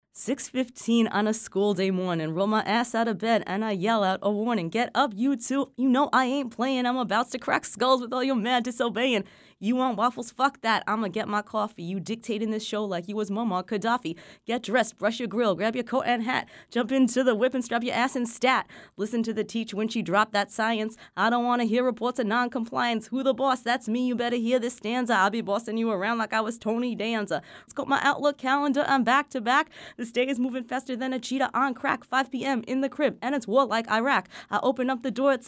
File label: rap